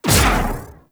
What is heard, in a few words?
Pulse Laser Shot; Warframe; Railjack; RailJack Weapons